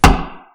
tap

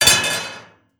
metal TTRKL

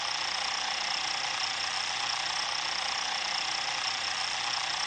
low Whir WHRR

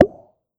pop POP

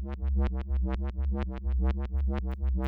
semitone Loop DUHDEH

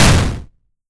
grenade

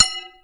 ting TING

